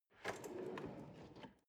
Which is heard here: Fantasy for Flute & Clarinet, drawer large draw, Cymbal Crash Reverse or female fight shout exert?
drawer large draw